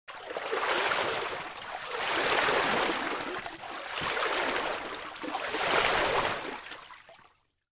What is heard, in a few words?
Swimming in pool breaststroke